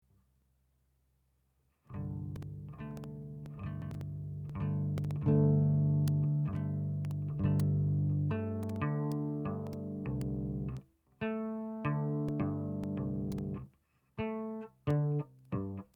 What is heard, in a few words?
Example pops clicks max live